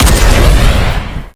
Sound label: railgun shot